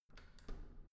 entrance door handle down soft

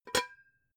pot lid take off harsh; objekte; topf

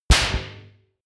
gun clapping pop gun; schusswaffen

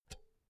pull out cinch soft; objekte; elektronik

